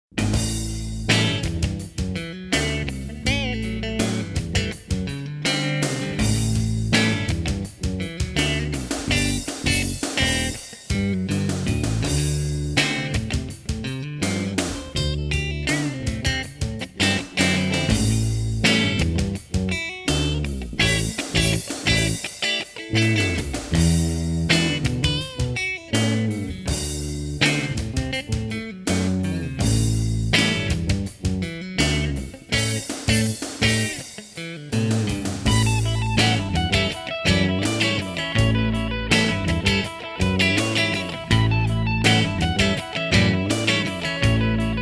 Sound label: Blues; Wile E